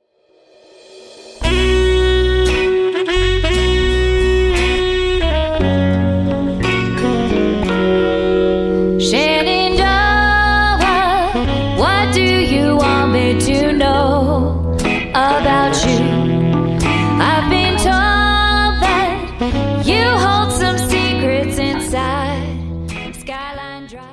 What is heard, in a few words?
Blues